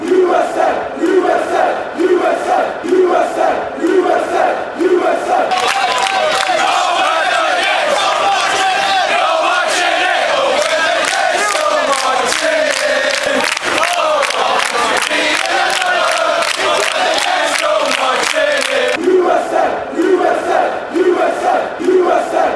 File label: world cup chants usa